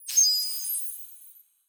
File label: pickup ice; Learn to Code From Zero With Godot